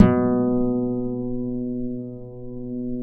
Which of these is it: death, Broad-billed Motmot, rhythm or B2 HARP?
B2 HARP